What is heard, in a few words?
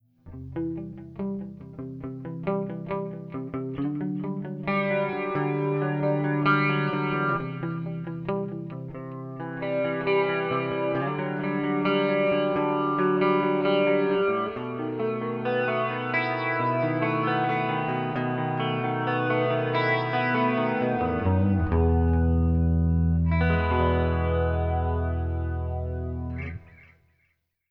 flanger; tc electronic nova system